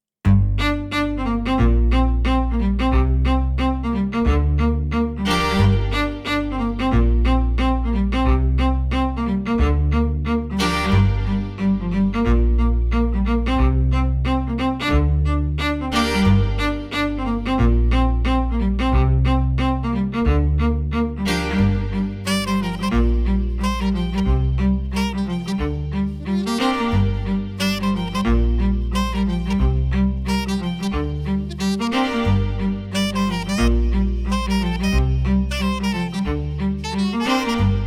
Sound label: Waves 90bpm lofi